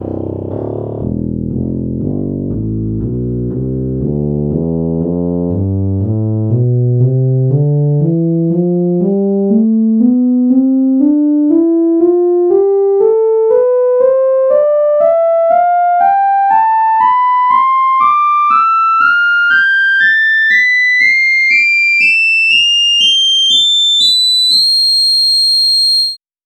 tuba tonleiter

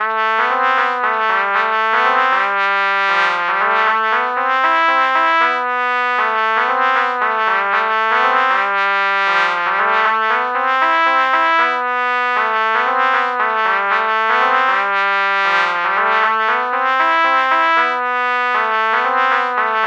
trumpet trumpet melody morph low

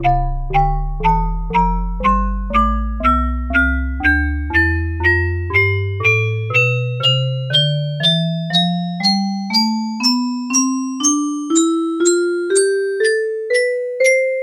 music box tonleiter

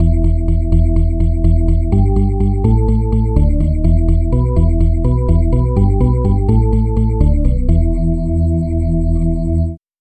drawbar organ bass

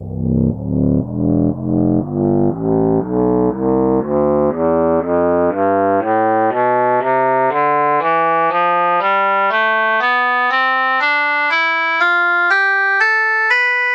shenai; tonleiter

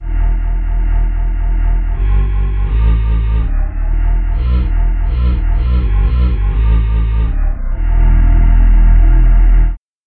synth voice bass

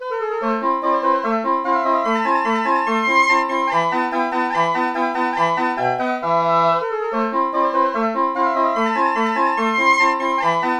english horn

